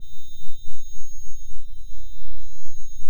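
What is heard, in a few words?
high shimmer, choir; NorthStar - The Wizard VOL-2; C-SND CHOIR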